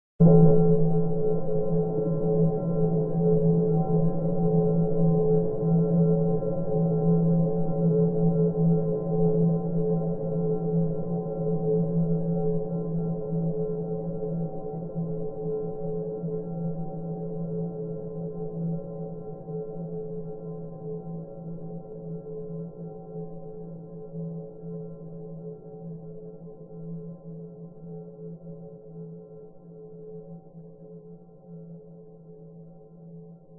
horror hit bell like clunk med pitched eerie desolate cold; zapsplat